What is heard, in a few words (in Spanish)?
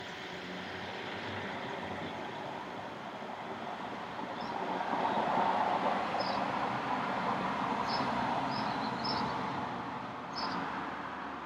casa coche